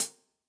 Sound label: Acousti Hat Hit, hihat; Ultimate hihat samples